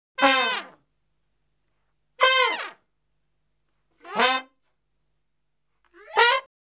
Salsa Picante; Masterbits; World Zone VOL-3

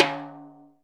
DRY P TIMB, drum; Crypton - DrumBase5000; DRY KITS

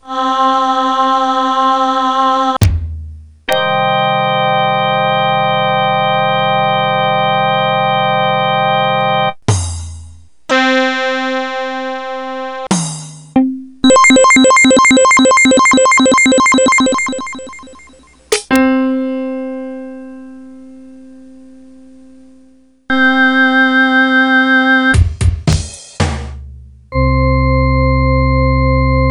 Proteus

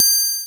Casio SA-10 Triangle; 300 Drum Machines

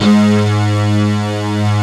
LINEAR SYNTH

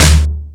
FX Sounds, Drum Machines

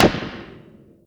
DR-660 Perc, Drums, Boss DR-660

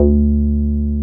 303 SQR D3 P, SQUARE BS

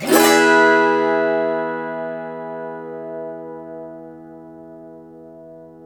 AUTO HARP, Auto Harp Majors

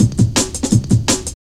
Build Up Cut, Drum Loops 166.5; Zero-G Jungle Warfare 1, 2 & 3

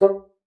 bassoon, G3; Xsample VOL-6

